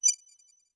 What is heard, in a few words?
ui click